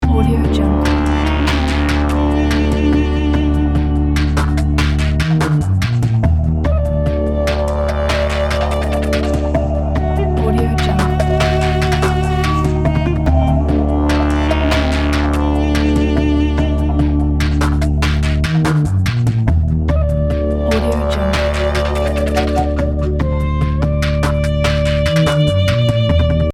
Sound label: adventure ethno loop